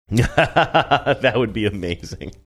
laughing saying that would be amazing

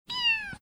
meow

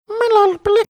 imitating marsupial gurgle sound

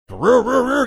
making engine revving sounds

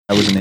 snorting

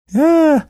making funny sound

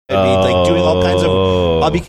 long drawn out ohh

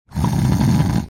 making snoring sound

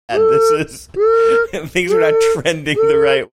making alarm sounds

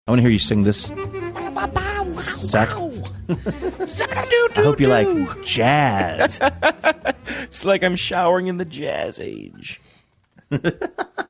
scatting along; saying i wanna hear you sing this

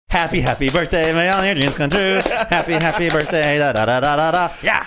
singing happy happy birthday

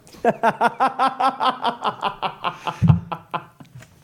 awesome laugh

